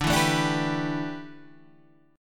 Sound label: A 9th Suspended 4th, D